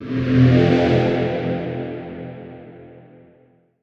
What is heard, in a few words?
note cinematic womp; Polyend; Recue; melodic